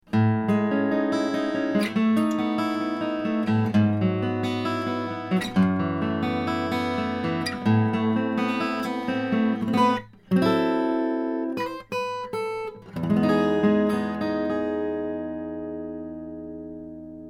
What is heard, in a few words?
arpeggio sample